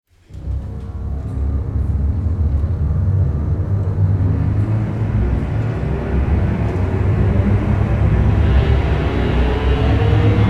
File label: guttural rise